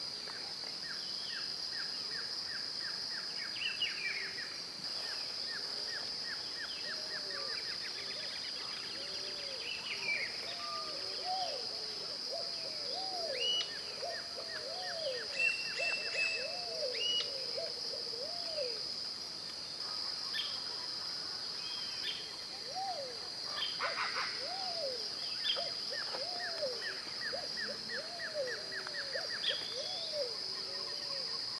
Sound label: Glossy Antshrike